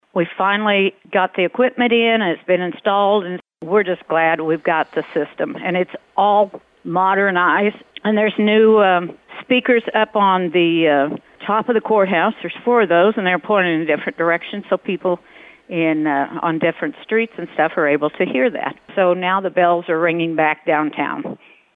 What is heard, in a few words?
Carillon